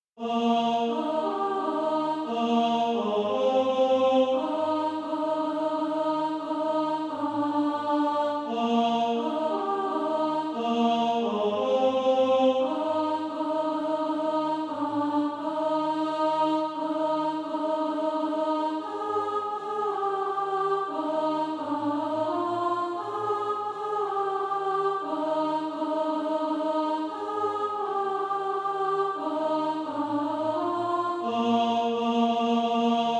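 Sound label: alto, Practise Music; Cleansing Wave; satb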